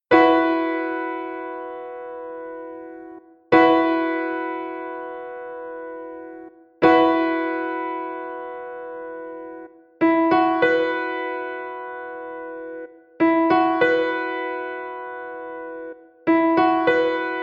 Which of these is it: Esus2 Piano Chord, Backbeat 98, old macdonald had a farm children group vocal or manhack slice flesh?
Esus2 Piano Chord